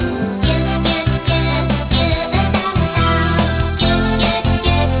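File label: guitar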